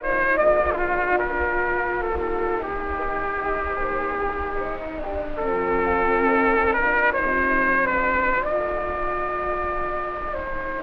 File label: trumpet solo with orchestra, saxophone